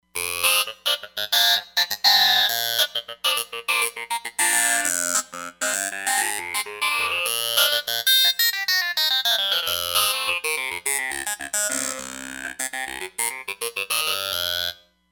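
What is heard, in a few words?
BPF Clavi Phaser, Roland Fantom XR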